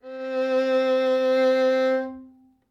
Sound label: Violin C 5 Tenuto Vibrato